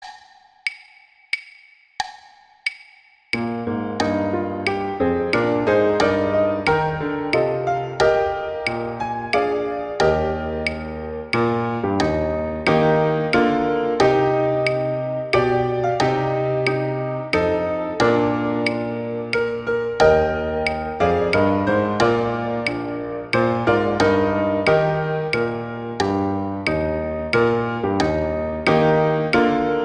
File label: The Star Spangled Banner acc; Sax